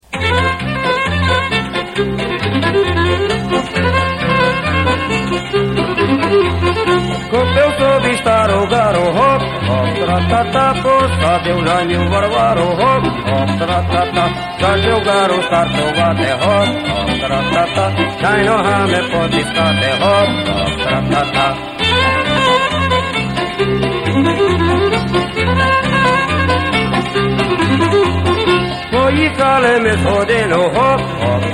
car song

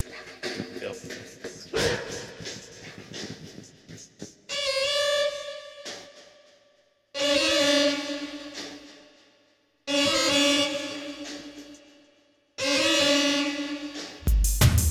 pop violin

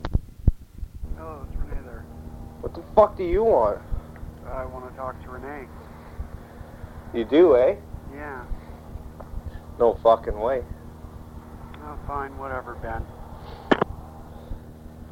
shoes

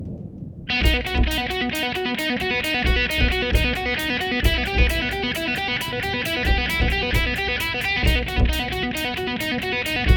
hip hop

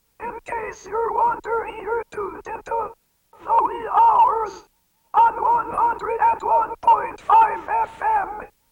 jingles; Station Call #3

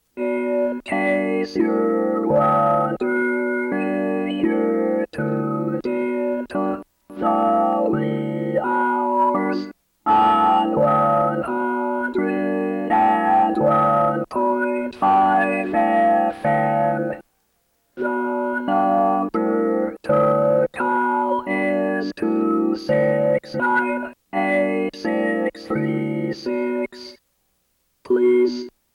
jingles; Station Call #5

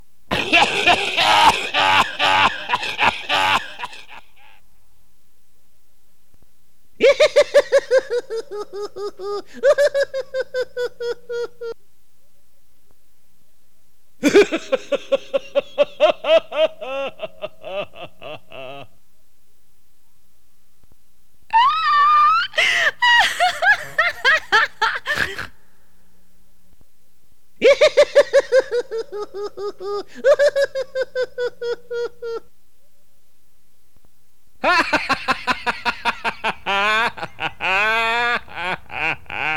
laughing sfx

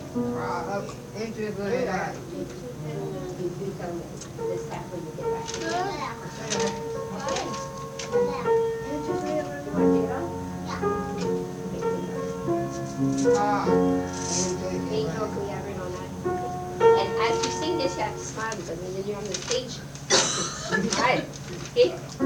choir angels

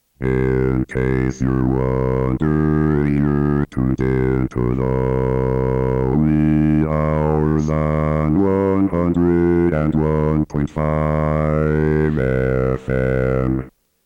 jingles; Station Call #1

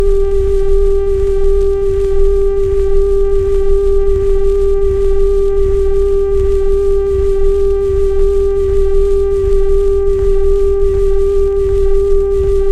tone record

